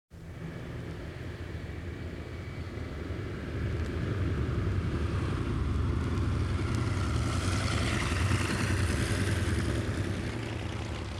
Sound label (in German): rollende propellermaschiene; Am Flughafen